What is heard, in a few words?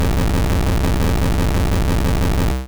Thunderbolt; Pokemon; Gen 1; attack moves; RBY